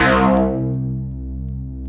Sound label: C1 FM, Synth Line; 90 s Sample CDs; NiceBeats; SynthLine VOL-20